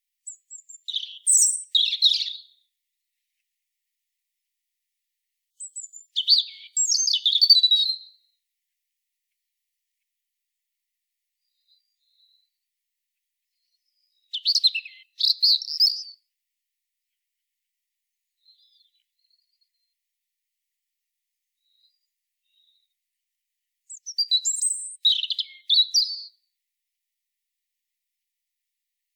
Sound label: Erithacus rubecula; SETE